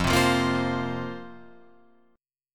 F Suspended 4th F down Guitar Open F

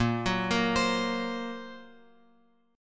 A sharp Suspended 2nd Sharp 5th A sharp; A#sus2#5 Chord {6 9 8 X X 8} arpeggiated

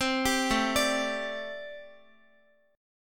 A Minor 11th, Guitar; Am11 arpeggiated; JGuitar

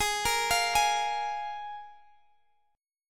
F Minor Double Flat 5th, G sharp

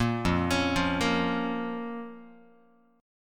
F Suspended 4th Sharp 5th, down, Guitar; arpeggiated; JGuitar

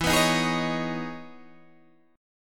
E Major 13th down Guitar DADGAD

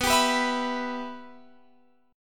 B Suspended 4th Sharp 5th B Guitar Open F; Bsus4#5; strummed; x,18,19,18,19,18

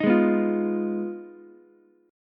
G sharp Minor 6th G sharp down Ukulele; G#m6 strummed; JGuitar

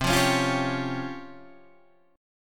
Db Major 7th Flat 5th C

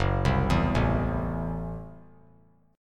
Gb Minor 7th Flat 5th Gb, guitar; arpeggiated; 3C,3F,3A,3D; JGuitar

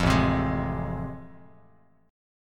A Minor Major 7th Sharp 5th A, Bass Standard; strummed; AmM7#5; 13,0,10,13; JGuitar

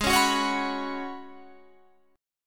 F sharp Suspended 4th G sharp; strummed

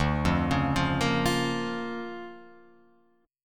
D Minor Major 7th Sharp 5th, D, Guitar, Open F; 2,0,1,0,1,0; DmM7#5 arpeggiated; JGuitar

